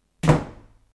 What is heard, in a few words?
Auditory Icon Door Closing